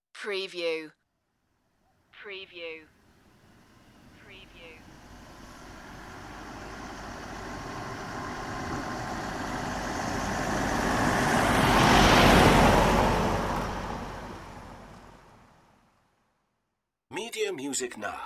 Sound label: pickup passing